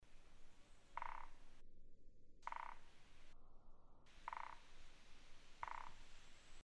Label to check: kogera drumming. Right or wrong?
Right